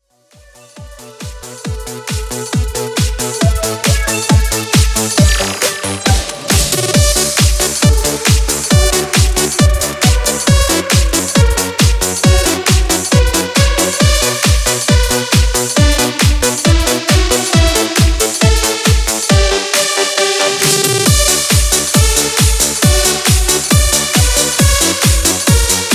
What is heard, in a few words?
Re Drum 136Bpm